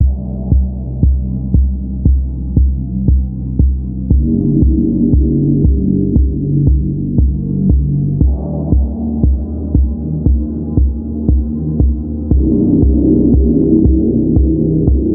casio sk-1 vs yamaha vss-30; weeklybeats-2014

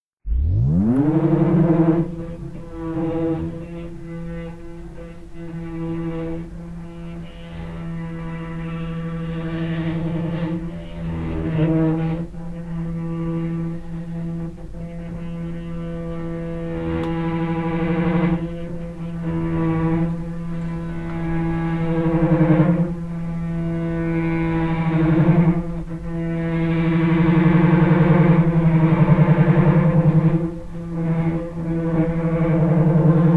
field recording neighbourly love; weeklybeats-2020

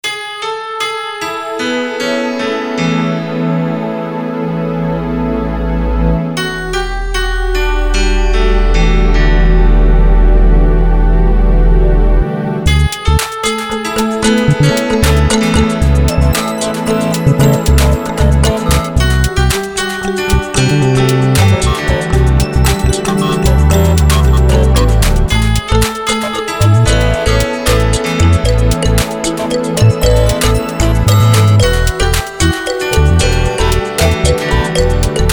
synth noodle a few takes

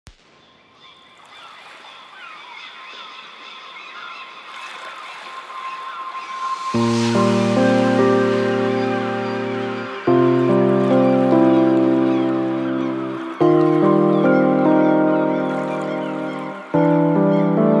desert loop

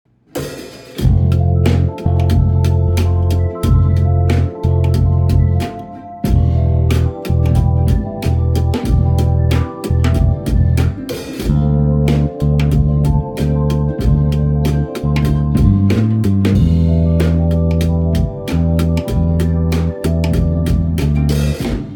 short tune with short breaths; weekly beats 2020

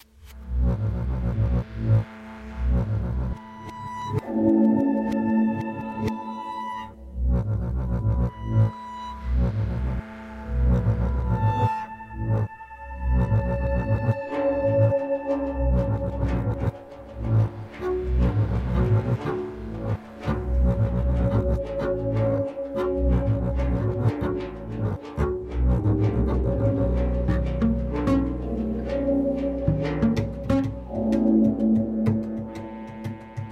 electronic evergreen; weeklybeats-2014

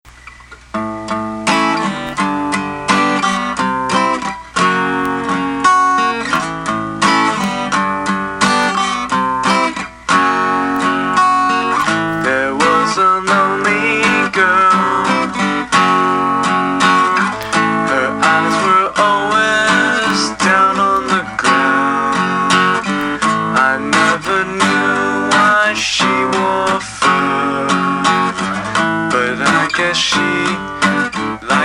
lonely folk; weeklybeats 2012

